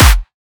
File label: drum kick thicc clappp; Virtual Riot; Drum Hits